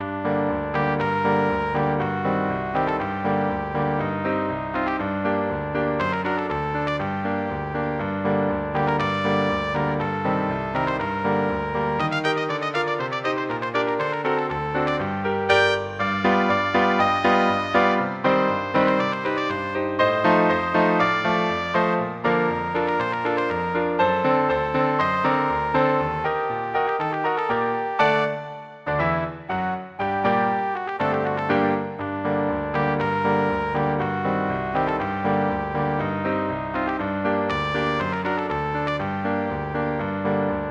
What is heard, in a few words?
brahms hungarian dance 5, trumpet